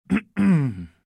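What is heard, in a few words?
calmly cleared his throat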